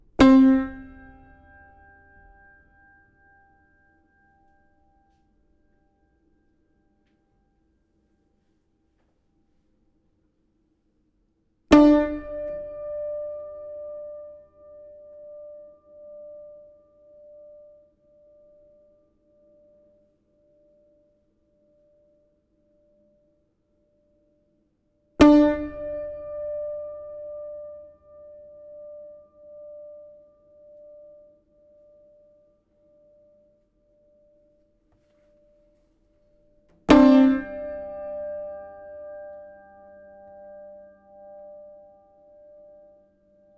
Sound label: prepared piano